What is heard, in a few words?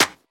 Claps & Snares